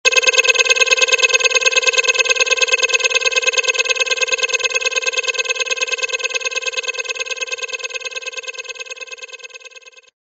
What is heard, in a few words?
charging